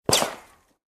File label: heli fire impact; Helicopter